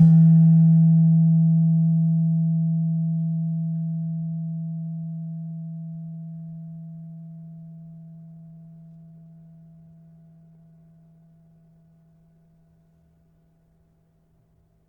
Slentem 3 E, gamelan tuned

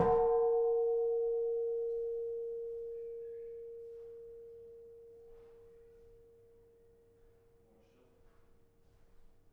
Kenong soft, gamelan; gravityharps; timbrebox

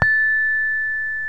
hi gangsa mid, gamelan; gravityharps

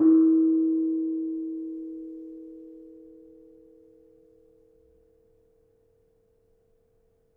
Kenong soft, gamelan; gravityharps; timbrebox

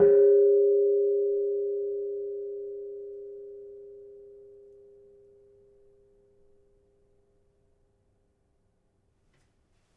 Kenong Pl 4, gamelan; gravityharps; timbrebox

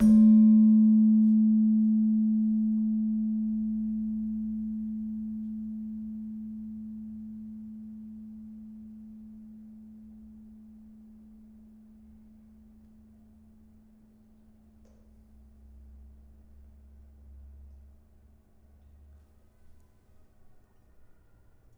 Slentem, gamelan; gravityharps; timbrebox

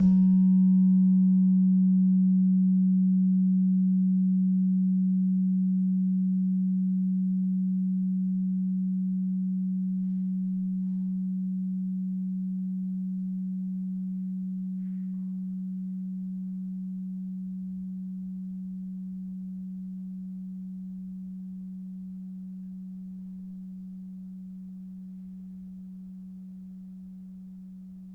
Slentem 3 G, gamelan tuned; gravityharps

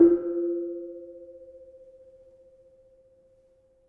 Kenong Pl 4, gamelan; gravityharps; timbrebox

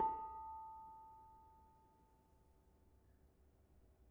Bonang Barung soft, gamelan

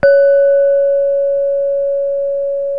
gangsa mid, gamelan; gravityharps

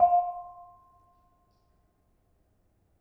Bonang Panerus, gamelan

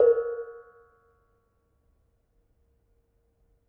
Bonang Barung, gamelan

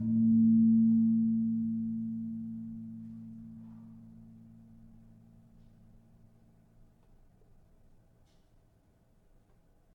Gong 3A Sharp, gamelan tuned

